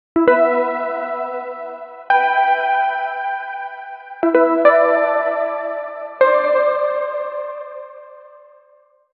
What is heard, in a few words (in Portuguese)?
teclado tocando duas nota; keyboard